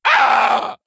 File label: pain zombie; cstrike